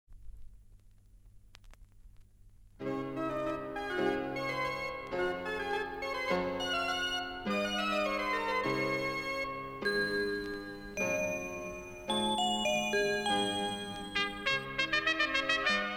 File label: cristal trumpet